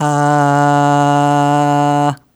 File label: Aah Short D2 Dry, Harmonic Building Blocks